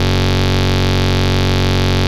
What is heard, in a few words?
Ultimate Bass Root F#; Vengeance Samples